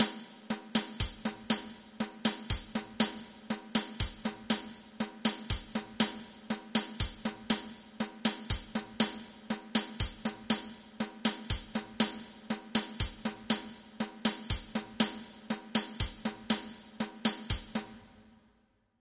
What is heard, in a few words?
rai maghreb; Creating Rhythms